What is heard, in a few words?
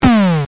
Enemy Big Death